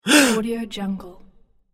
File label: male surprised